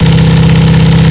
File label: vtwin small, engines; acf engines